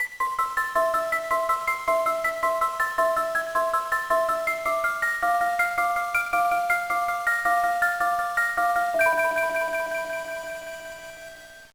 Jingle, GBA; columns crown gba

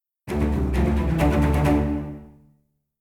Combat Out; Skellige

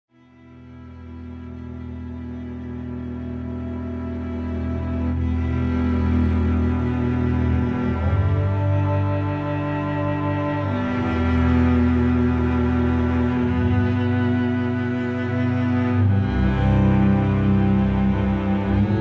Emotional (Loop)

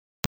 Silence; betrayal at krondor

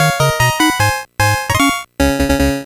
Stage Clear; Normal Game (Retro); bomberman party edition

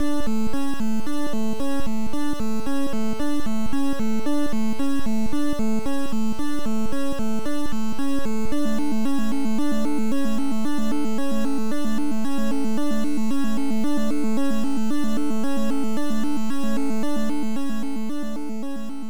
Boss Approaching; nes

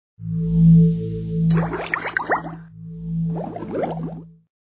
menu Music; SpongeBob SquarePants Invasion of the Patty Snatchers

